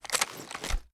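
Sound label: weapon foley assault rifle reload empty end; gmod; viper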